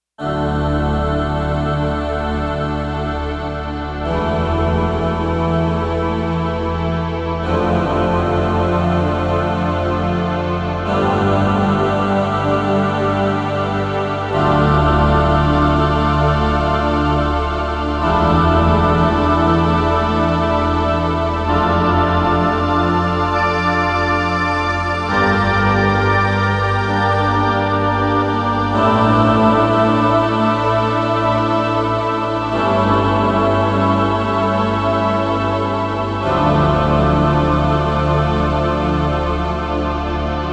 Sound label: Slow Orch Pad; Digital Synthesizer